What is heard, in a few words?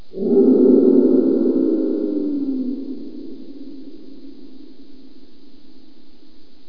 Creepy; In the Line of Duty Firefighter